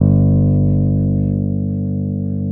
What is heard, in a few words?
CP70